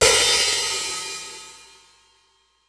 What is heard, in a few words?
HH OP, Drum Dry; East Collexion; Drum 1